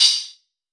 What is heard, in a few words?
Metal Crotale Hat; Airwindows